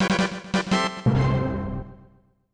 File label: Victory!; Hanjuku Hero; snes